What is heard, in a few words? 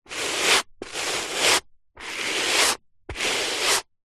household brush small scrub rug slow strokes